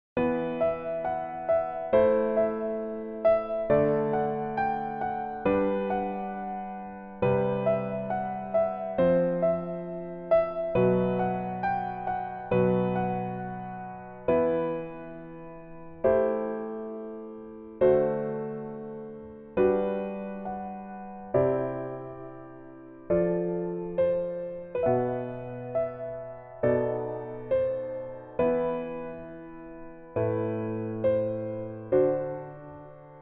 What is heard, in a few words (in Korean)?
Piano ver 남자키; 복면가왕